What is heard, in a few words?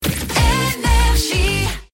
Jingle; HYPER ID; NRJ; RAPID 2023